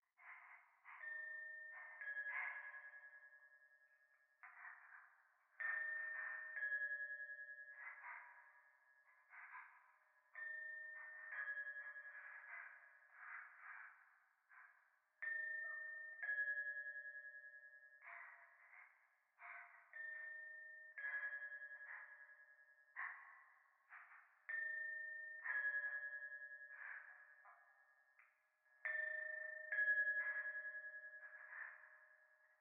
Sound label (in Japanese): 左耳快感吐息 教会; 神社